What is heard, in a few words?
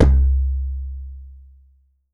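LARGE CERAMIC, DUNBEK; Voice Crystal - Steve Reid Definitive Percussion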